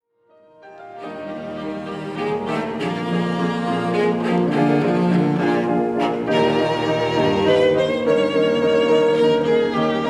Trio No. 5 in E-flat Op. 70 No. 2 I. Poco sostenuto Allegro ma non troppo; Beethoven